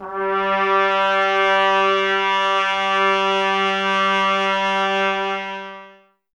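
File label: brass trumpets G; 90 s Sample CDs; Miroslav Vitous; Woodwind Brass Ensemble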